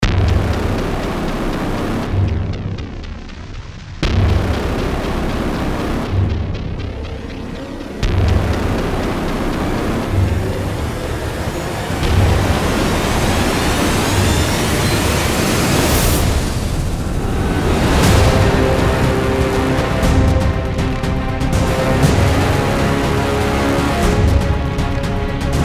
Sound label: epic destructive blockbuster trailer